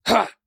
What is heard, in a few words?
attack